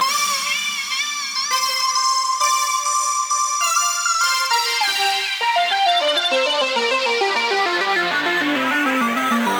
G Phrase Corroded Synth; Zero-G; Future Wave

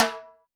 Timbale High, Yamaha PTX8

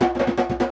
conga licks

